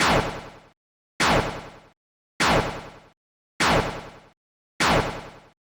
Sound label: devils knife; jevil